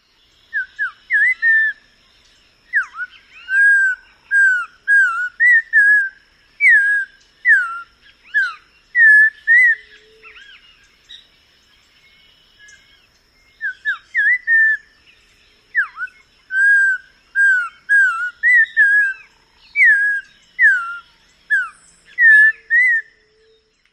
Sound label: song